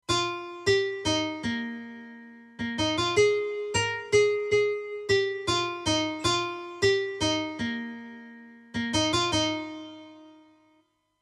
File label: Guiter Intoro; Belos